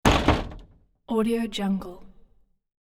very old cellar wooden door closes

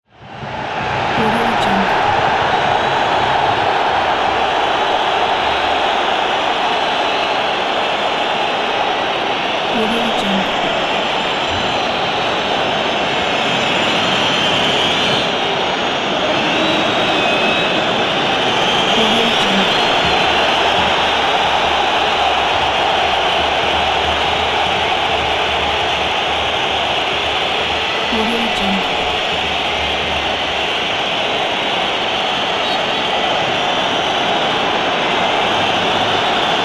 football stadium crowd discontent and indignation

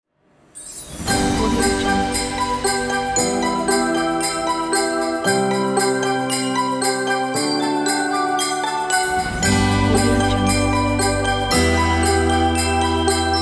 christmas calm opener ident

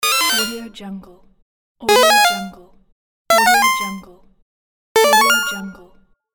8 bit game pause

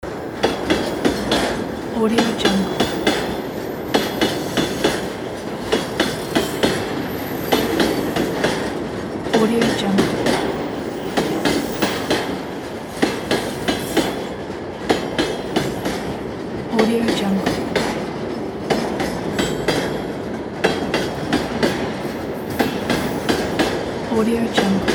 train; magicsoundflower

